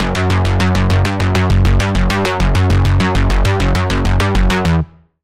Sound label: Bass Industrial Move, Sylenth1; Routed Sound; Sylenth1 Expansion; Doom Carpenter Vol.1